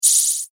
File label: Laser Shimmer Shot, laser gun, science fiction sound effect; star wars / trek style games; Andrew Fleming